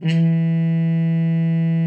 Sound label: HARM E3, TR MUTE; Xsample VOL-3